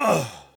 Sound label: enemy cultist acolyte death; sfx